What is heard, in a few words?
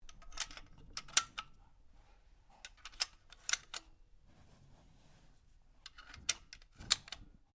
RIFLE REMMINGTON700 7.62MM LOAD ROUNDS; A selection of domesttic firearms; Rifles; Guns